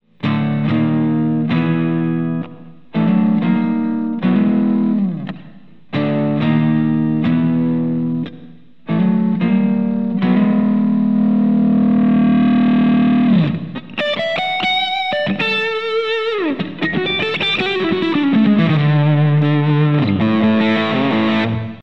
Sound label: BD2 Full Gain